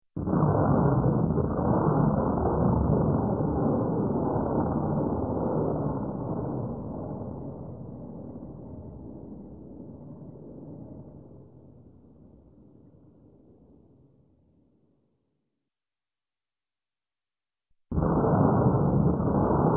Explosion Atomic Blast, Bomb; Sound Ideas 2000 Series

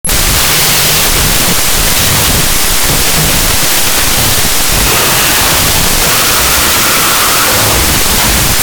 Packing Boxes; 1001 Sound Effects; Foley

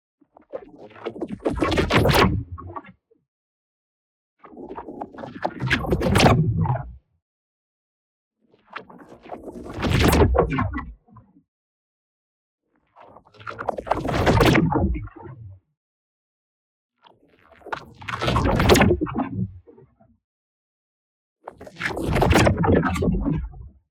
ABILITY DEACTIVATE; Energy Tentacles; boom library; Matter

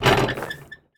Street Ball Glass Basket

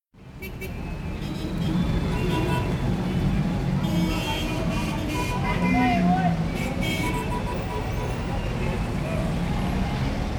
Traffic in Bombay; KMP Film Video Sound Effects

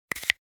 camera lens cap; NCH Library

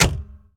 Mustang Hood Close, Automobiles

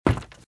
Footsteps - Wood - Creaky - Run - Right

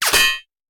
Bullet Impact; Sound Ideas; Guns

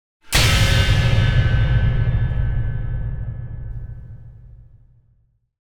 Impact Metal Strong Hit - Lower; Hollywood Edge; sound designer's toolkit v2

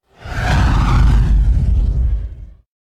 Fire Ball Firing A Fire Ball; Hollywood Edge; sound designer's toolkit v2

